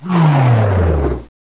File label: fridge shutdown; ambience; rebar